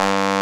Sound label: BRASS G1; POP BRS